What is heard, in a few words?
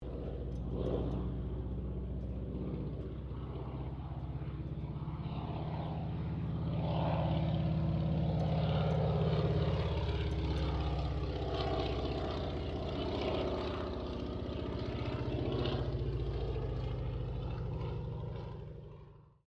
AIRCRAFT 1916 SOPWITH PUP REPLICA PASS STEREO; Replica of a 1916 Sopwith Pup; Airplanes; Vehicles; Aircraft Collection